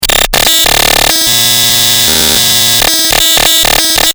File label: bass